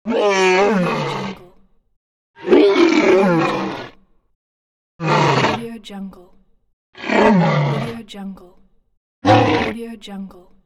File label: boar